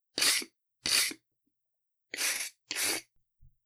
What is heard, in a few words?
wire brush, Hand Tools; Cfry